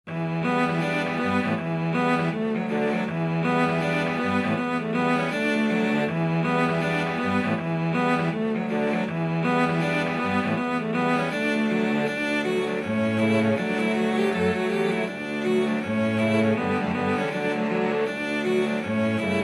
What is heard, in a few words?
cello quartet